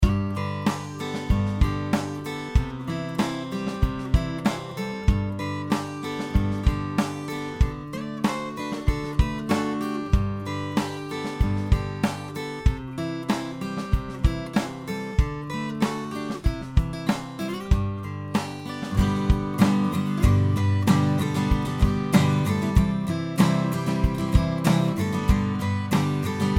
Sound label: CHANDLER Limited TG 2 500